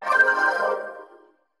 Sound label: perc, Roland MC-909